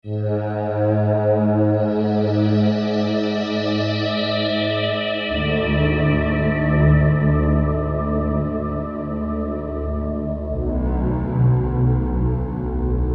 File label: stinger soft